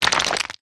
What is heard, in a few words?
ice particles; factorio